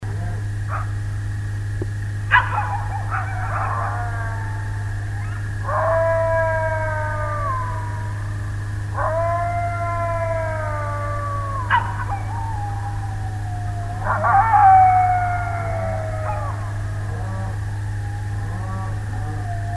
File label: Illinois Howl unfiltered